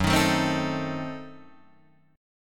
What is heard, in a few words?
Gb Diminished 7th Guitar Open D